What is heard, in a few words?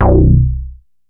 MOOG BASSLINE; OLD SYNTHS; MINIMOOG; Rubber Chicken Software Sample Bank